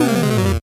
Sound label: 8bit se